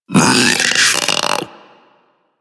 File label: Infested Splitter Split Top Vocal Attack Medium; Warframe; Grey Strain